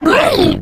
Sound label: female zombie growl; voice; Left 4 Dead 2; boomer